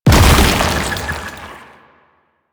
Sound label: Rolling Rot Proj Explosion; Enemies; Abilities; Warframe; Proto Infested; Babau